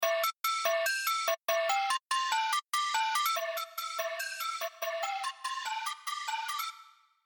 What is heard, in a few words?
Spect Bell